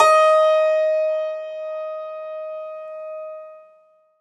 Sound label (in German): HACKBRETT; Alpine Volksmusik